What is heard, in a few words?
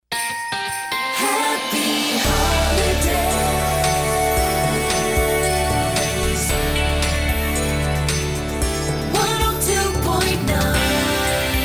FM Christmas Jingle; WLTE